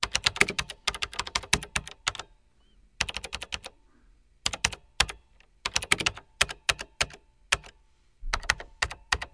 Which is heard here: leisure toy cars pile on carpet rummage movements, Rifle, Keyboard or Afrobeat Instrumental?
Keyboard